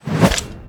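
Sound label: attack katana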